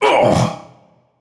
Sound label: grunt hit